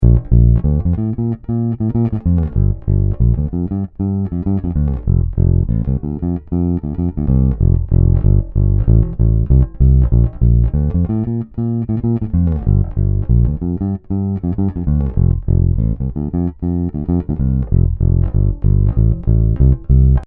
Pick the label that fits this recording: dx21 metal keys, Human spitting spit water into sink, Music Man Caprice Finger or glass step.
Music Man Caprice Finger